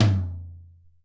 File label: Room Tom L, Alesis SR-18